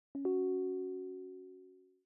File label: new message; mail; odoo